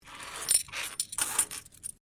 Coin Penny Multiple Move Wood; Common coins; Money; Objects

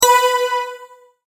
multimedia tone correct

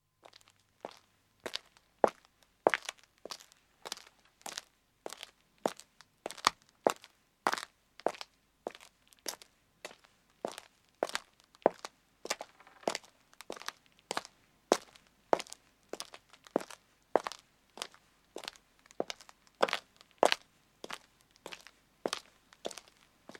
Footstep road walk, 1 man starts and stops; BBC

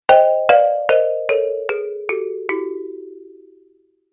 Cartoon Slow Fail; Cartoon Sound Design Sound Effects

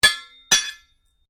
Strike Metal On Metal Heavy Ring Double; Foley sounds for melee weapons; Metal Sound Effects; Materials

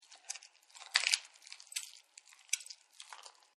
Bone Crunch, Dogs; Animals; Partners In Rhyme

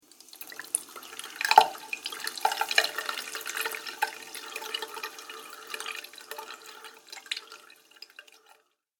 Water Filling Sound, bathroom; Water Sound Effect

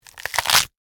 Crunch Celery Slow; Texture; BlastWave

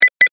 Multimedia Beep, Technology, Multimedia, Beep; Beeps; BlastWave

